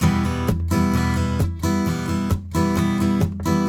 RIFF, Blues Guitar; ILIO; Fretworks

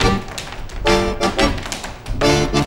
ACCORDEON; Best Service; World Colours; ACCORDEON+ST

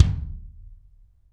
BD AMBIENT, Real Mega Drums; Best Service

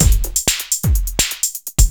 Beat, Drums & Percussion; Electrocution; Beats

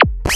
ZIP, FX, Analog; USB Soundscan vol.49; Analog Fantasies; AKAI

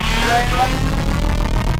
NOISE; ENVIRN-AMB; Rarefaction; Poke in The Ear With A Sharp Stick

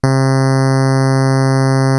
SYN WAVE; Back In Time Records; Big Bang

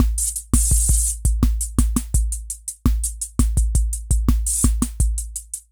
Anabeat Mix, Beats

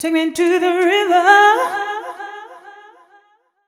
f 126 mel f-sharp, fem Vocals

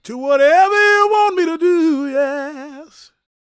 GOSP MALE, Vocal Gospel; Vocal Planet Vol1 Gospel

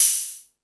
Hip Hop, Drum Machines

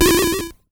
jump, ATARI

